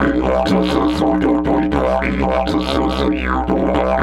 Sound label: 120, Didgeridoo